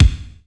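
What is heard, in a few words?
kick drum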